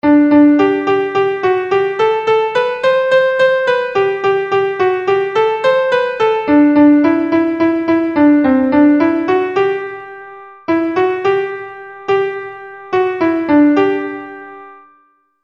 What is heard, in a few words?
the ivel navigation melody